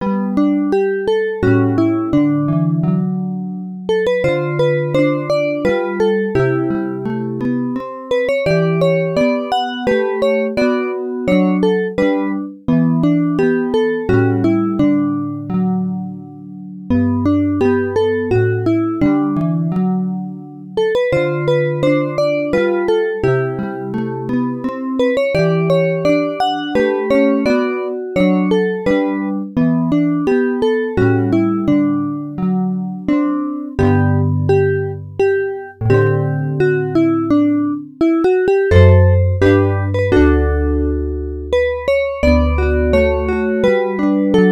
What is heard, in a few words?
Caprice For Lute; uncharted waters 2 new horizons x68000